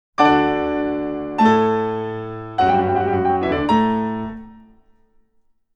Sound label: Sonata for 2 Pianos in D Major; Nodame Cantabile; K. 448, First Movement Beginning Part